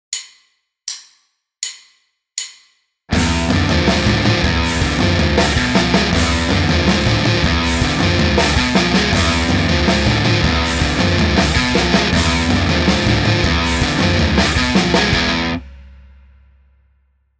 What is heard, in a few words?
Riff, guitar